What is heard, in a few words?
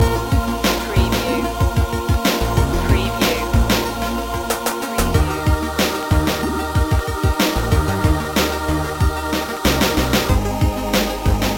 retro video game hotzone loop